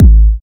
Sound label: studio kickdrum sample g sharp key